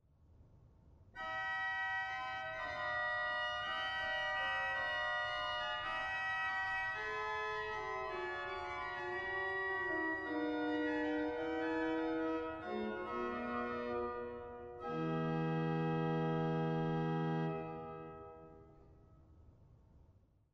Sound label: Praestant 4, organ; dortmund; Sauer